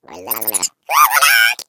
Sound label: eggbot big wave